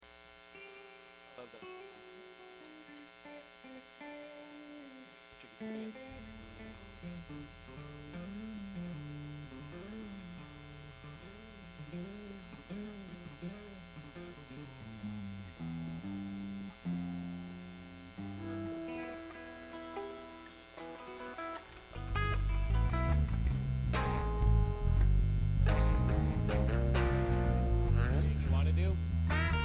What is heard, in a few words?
Buzz and Feedback Mix